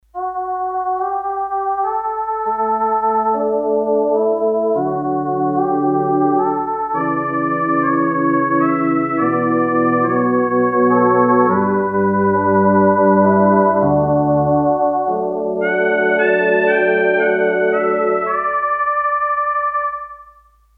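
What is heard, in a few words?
Synth Vocal, Korg DS-8; Factory Patches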